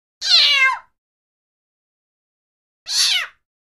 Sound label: Cat Meows High pitch; animals cat